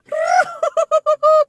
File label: laugh; irish pot luck